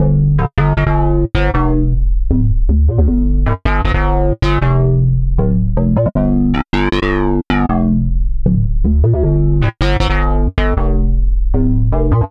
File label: Synth Bass